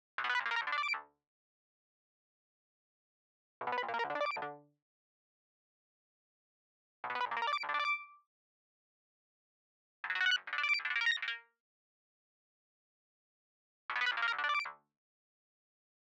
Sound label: Synth; ODGPROD